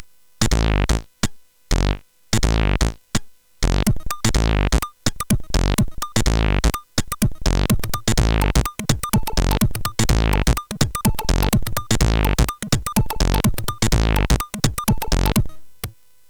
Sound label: Iferenz Funk, CSG8580R5